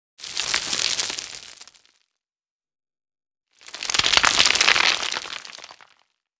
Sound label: rock rubble loop